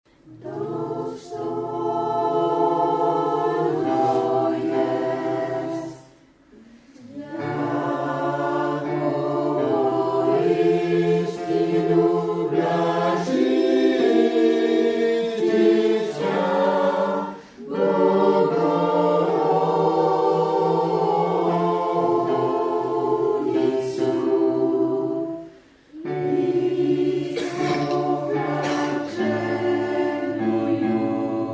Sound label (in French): dosto 3 voix